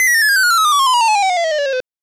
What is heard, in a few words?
Apple Falls